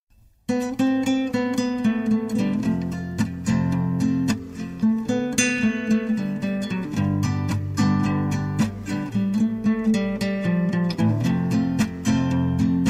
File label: Guitar Version